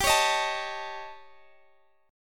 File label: A 7th Flat 5th G